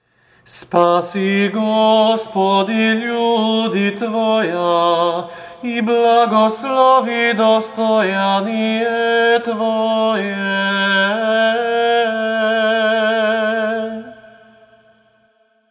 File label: glas 6, Serbian Chant; Vaskrsni Prokimen - glas 6